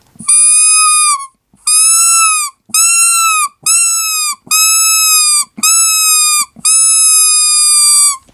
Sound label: Sly, fox caller